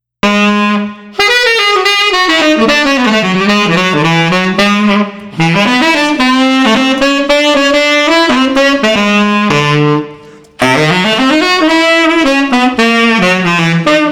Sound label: Street Sax NOLA Corner 110